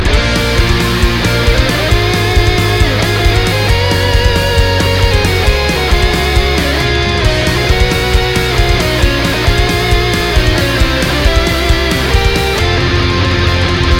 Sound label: theme metal; zelda